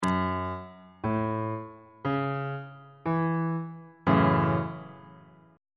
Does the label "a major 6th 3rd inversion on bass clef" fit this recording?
Yes